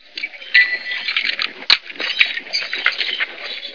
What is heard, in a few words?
chain